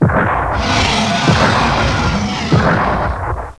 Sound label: ELEPHANT; APOCALYP